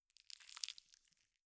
挿入音

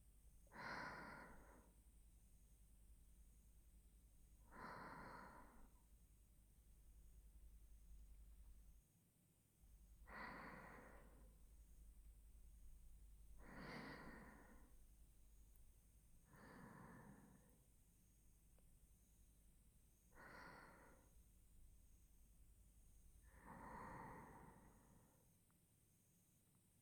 特典トラック 寝息(右側)

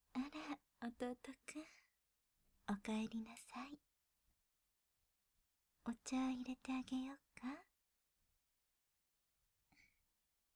お姉ちゃんの耳かき, ASMR

